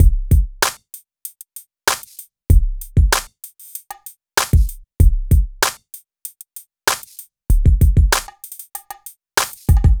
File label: Urban Beat, Drums